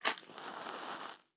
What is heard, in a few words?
Matches Light; Survival Game Sounds - Lite Edition